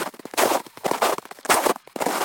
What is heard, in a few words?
footstep snow